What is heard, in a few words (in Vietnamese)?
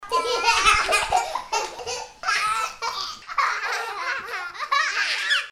cuoi tre con vui dua